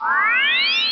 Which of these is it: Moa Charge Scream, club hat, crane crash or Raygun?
Raygun